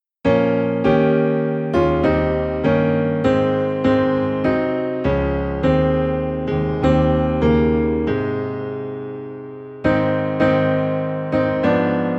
Piano Low in A Flat, hymns; Beneath the Cross of Jesus

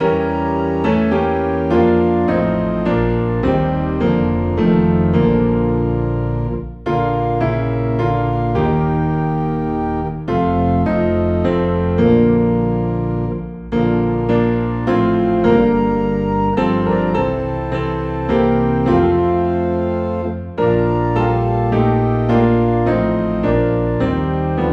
Piano and Organ Low in B Flat

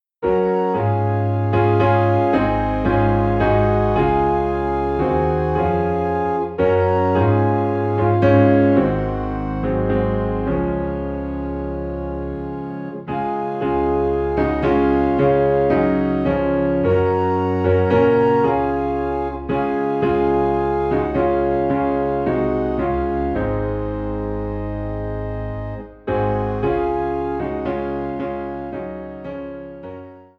Piano and Organ in D Flat; He Hideth My Soul

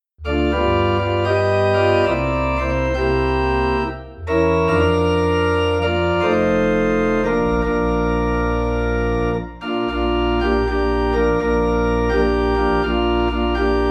Organ in B Flat

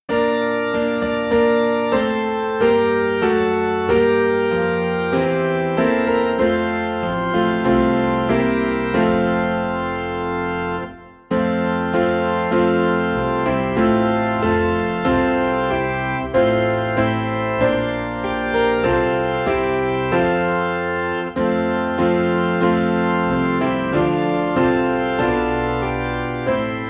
Piano and Organ in F; Hark the Herald Angels Sing; christmas

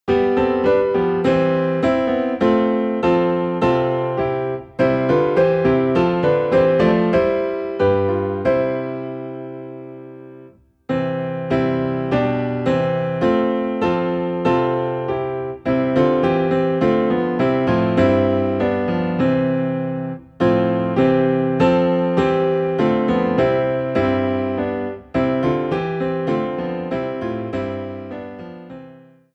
Piano in C; Christ the Lord Is Risen Today

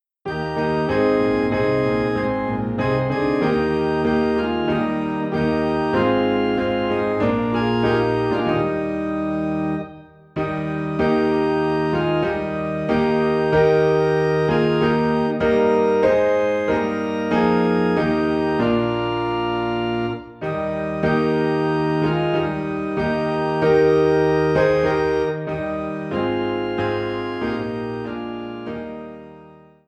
Piano and Organ in E Flat; At the Cross

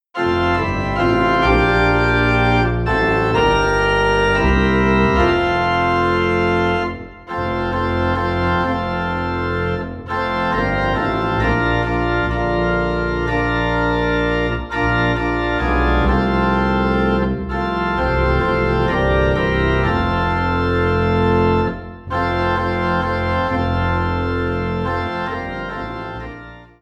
Organ Low in F

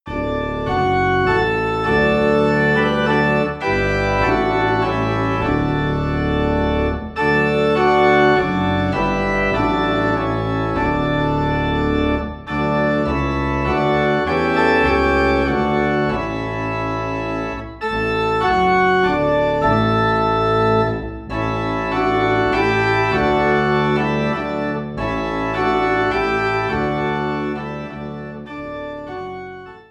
Organ Low in D

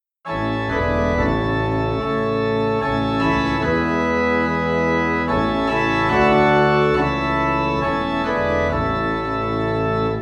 Organ Low in A; Come Thou Fount

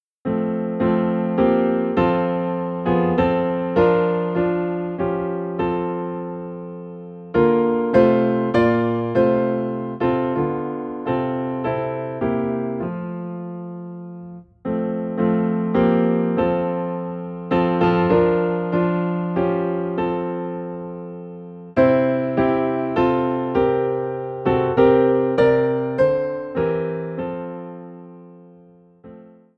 Piano in F

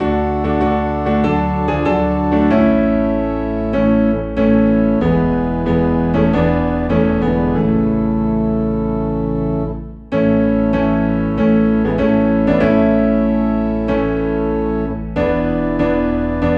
Piano and Organ Low in A

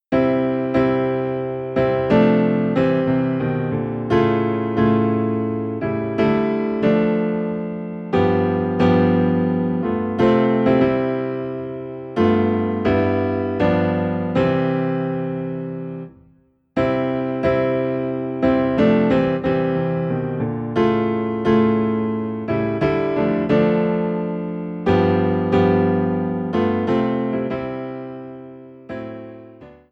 Piano Low in C

